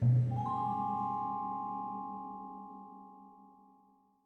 Sting; lego the incredibles 2018 switch

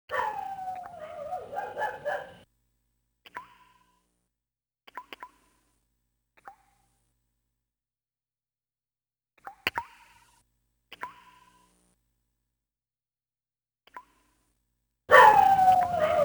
Stage Ambient Music; The Last Blade; Keiichiro Washizuka

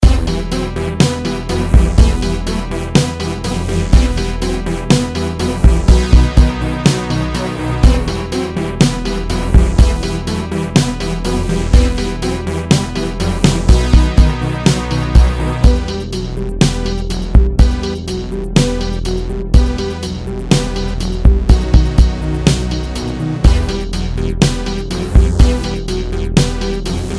Menu Music; Cally's Trials

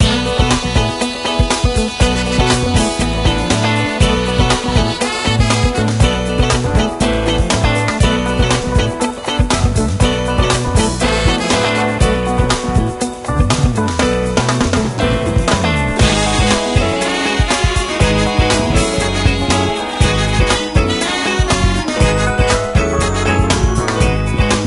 Dance Music

